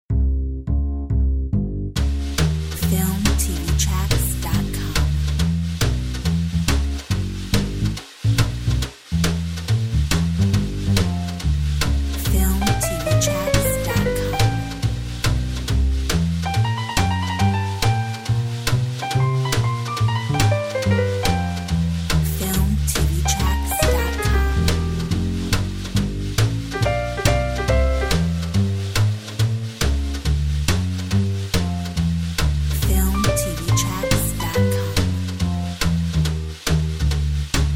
Drum bass; Filmtv-tracks

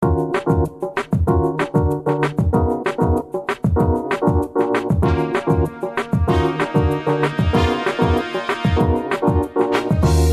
Lounge

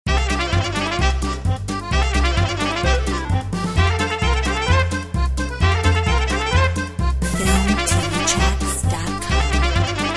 Balkanic Groove

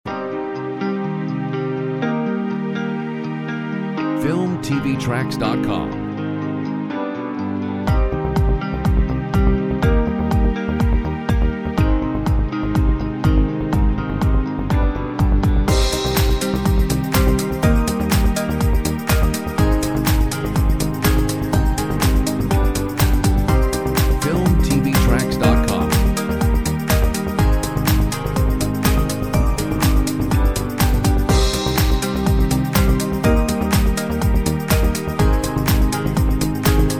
instrumental; Uplifting and inspiring pop rock

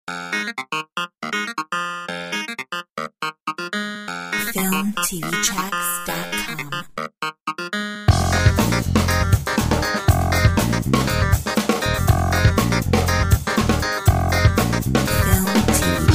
All funked up; Filmtv-tracks

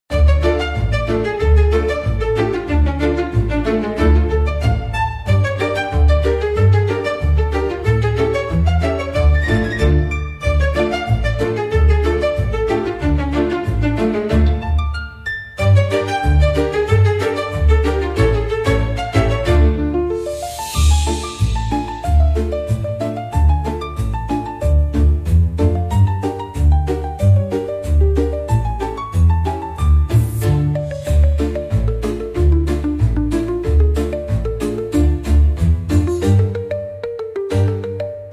Quirky Fun; comedy

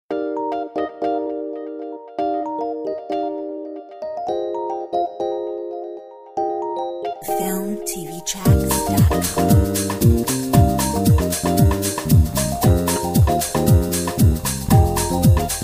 Bossa reloaded

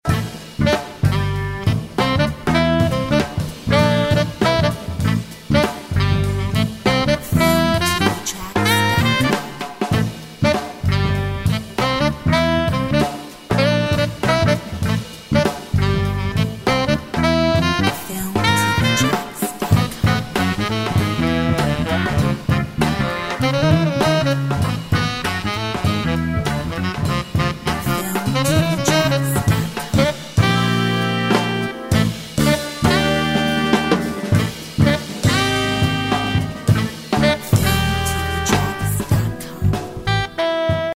Big Metal

